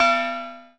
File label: Bell Ringing